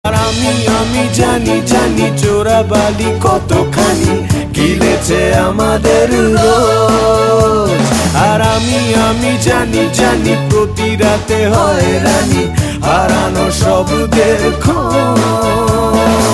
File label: BENGALI SONG RINGTONE